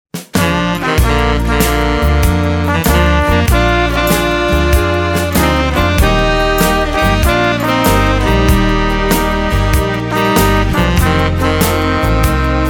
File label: Korg Pa3X